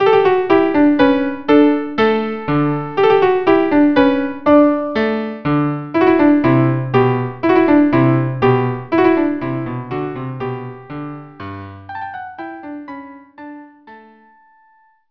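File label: violin, cello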